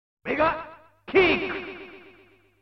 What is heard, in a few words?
Mega Kick